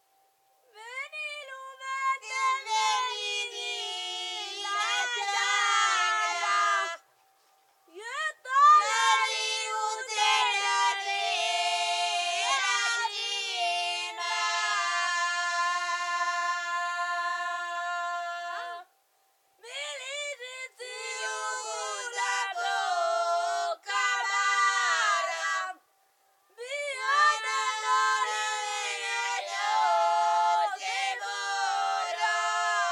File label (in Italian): canto di mietitura; veni lu ventu e veni di la chiana; Cardeto; Mammola; Cinquefrondi